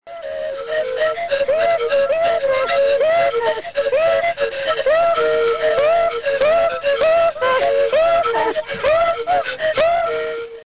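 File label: Russian Pipe ensemble three Women; Velitchkina Video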